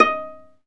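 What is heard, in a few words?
viola pizzicato, Solo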